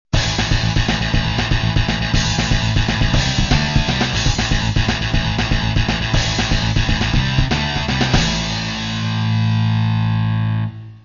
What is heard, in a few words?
soft